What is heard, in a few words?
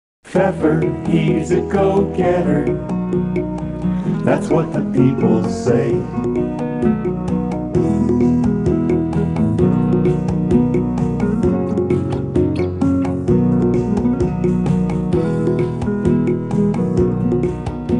Jingle